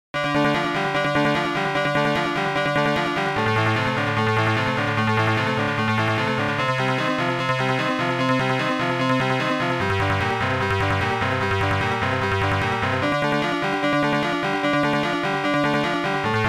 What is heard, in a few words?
OPL2; ZANACS; Tyrian